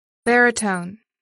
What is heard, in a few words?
baritone